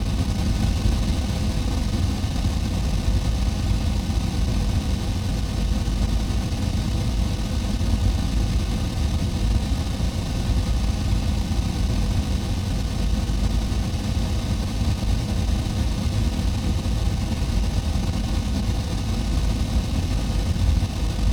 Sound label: 90 Texture Grains, Granular Synth Loops; Hydrosphere